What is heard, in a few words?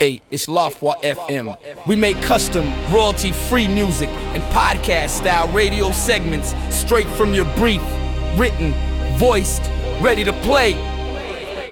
HipHop Segment; haha fm